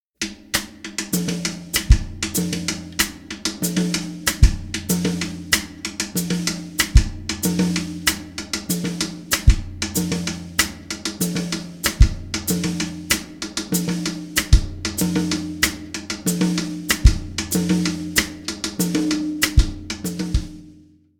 Cascara Groove; Cascara-Groove 4; Schwarzes Album; Bum Bum Tschack Jazz Latin

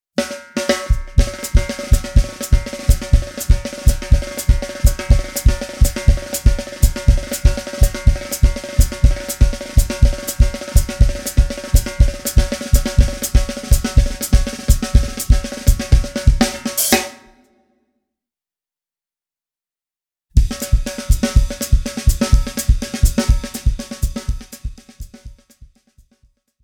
Baiao-Groove; Baiao-Groove 2; Schwarzes Album; Bum Bum Tschack Jazz Latin

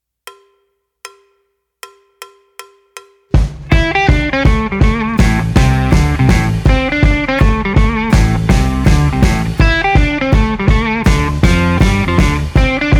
Solo, E-Gitarre; Schule fuer E-Gitarre

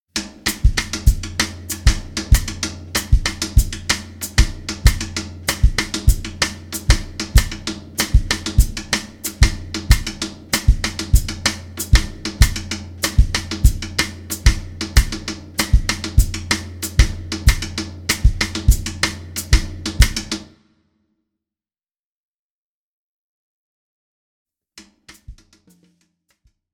Cascara Groove; Cascara-Groove 6; Schwarzes Album; Bum Bum Tschack Jazz Latin

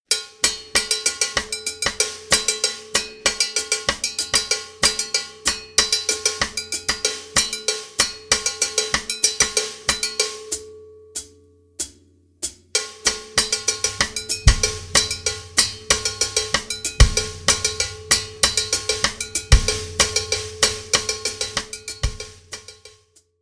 Mambobell-Groove, Latin; Mambobell-Groove 1; Schwarzes Album; Bum Bum Tschack Jazz Latin

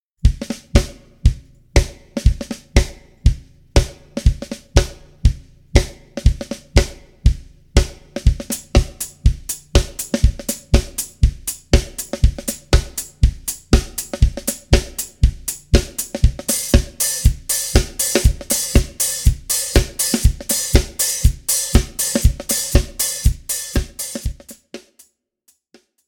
House-Groove 3; Schwarzes Album; Bum Bum Tschack Grooves Fills

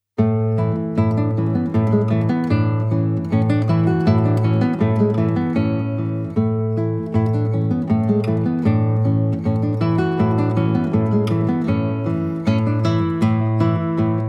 Basse Danse, Guitar

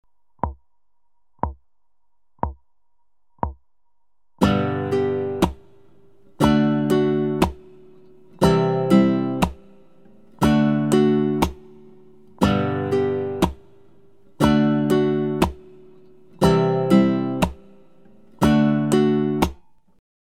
Strumming, Acoustic Pop Guitar; Strumming Nr. 6

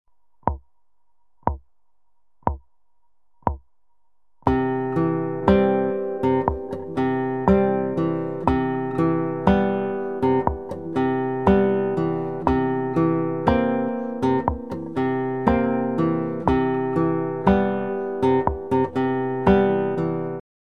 Picking, Acoustic Pop Guitar; Picking Nr. 12

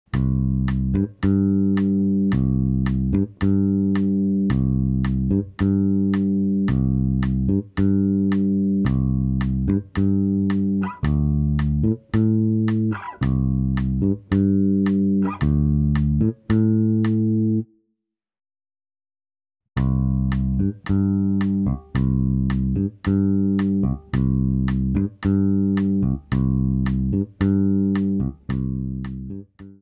Bossa Nova & Variation, Bass; Bass Unlimited

